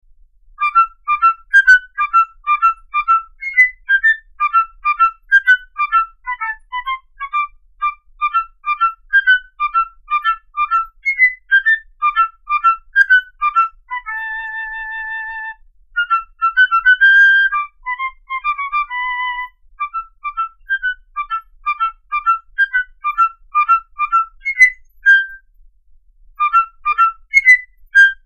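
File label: sound test flute; 録音に必要な機材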